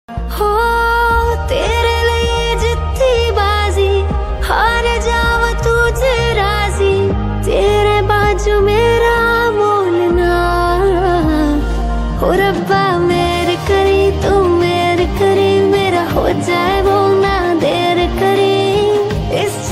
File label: female version panjabi ringtone